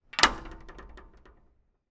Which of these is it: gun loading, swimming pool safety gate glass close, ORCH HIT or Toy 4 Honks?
swimming pool safety gate glass close